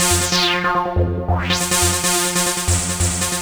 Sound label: Acid sample; AudioFusion TWS 1.0.1